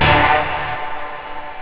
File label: rave; da capo vol1; instruments